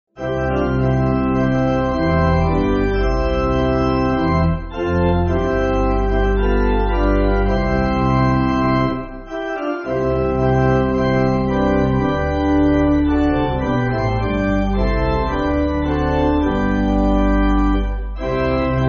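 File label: Pipe Organ, church music; We Desire Jesus Love; Canticle 294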